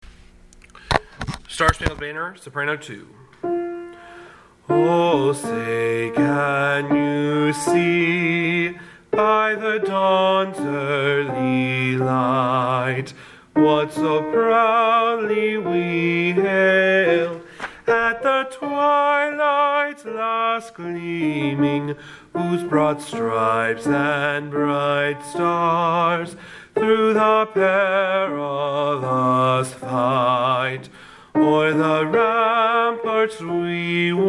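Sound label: national anthem soprano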